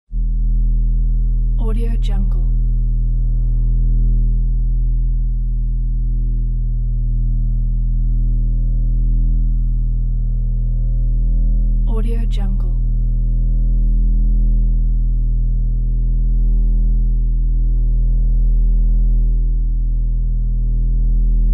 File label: low frequency hum sound